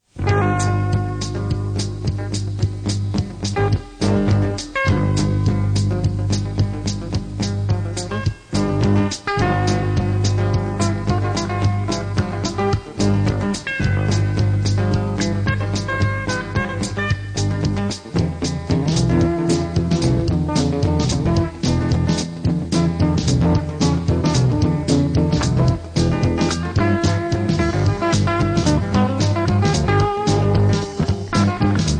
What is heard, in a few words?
Guitar Funk